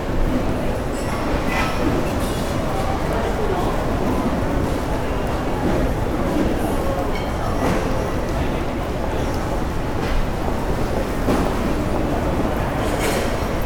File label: Urban airport interior TSA security checkpoint; urban and suburban; boom library